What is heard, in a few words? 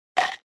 CRUNCH